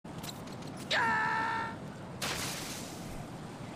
raiden fall

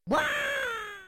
scream

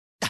noise

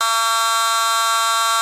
fire alarm

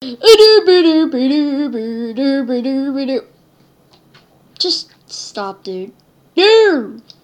derp song

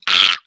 scream

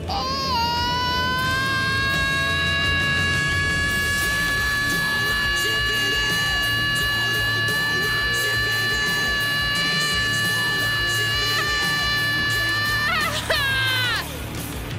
ahhhhhh

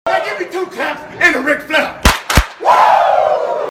claps

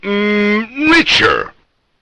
mmmmmmmmmmm; Morshu MMMMMMM Richer

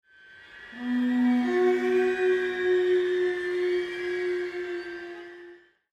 horn

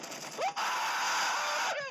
scream

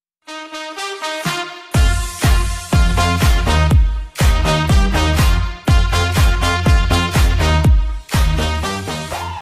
twitch alert sounds donation follower sub sounds

